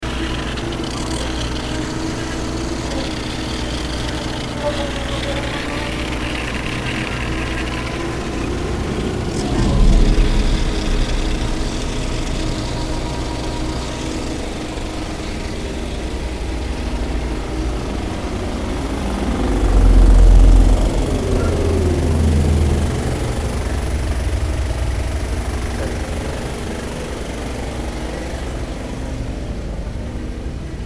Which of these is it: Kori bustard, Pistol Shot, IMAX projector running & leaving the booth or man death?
IMAX projector running & leaving the booth